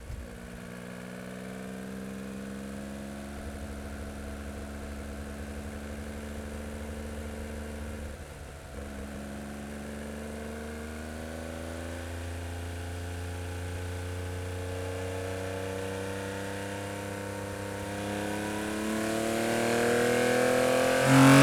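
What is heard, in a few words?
Porsche, 911, ST, Onboard, Fast, Drive, Engine, Left; 1972; Pole Position; DPA4061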